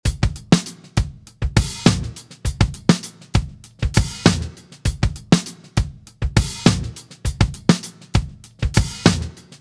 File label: Groove, Vintage-Sound; marc van bebber schlagzeuger session drummer producer studio musiker